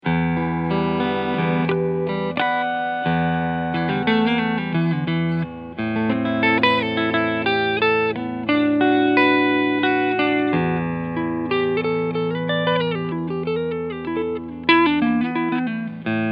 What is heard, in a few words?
Chapman Guitars ML3 Pro Trad Clean Sound Neck PU; Gold Metallic